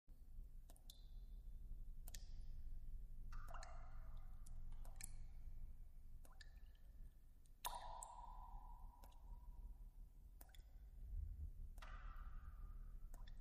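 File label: Water Drips Water Reverb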